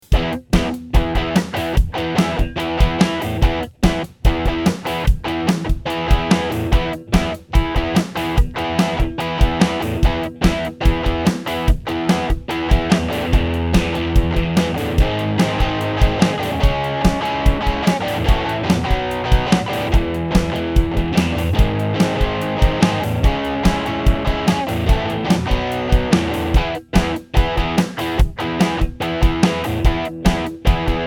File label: Instrumental